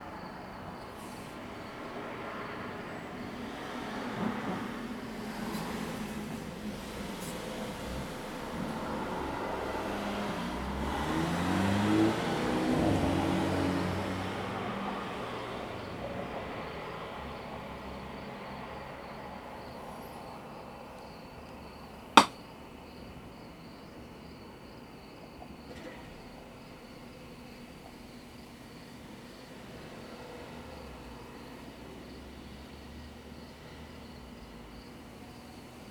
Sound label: Field Recording nighttime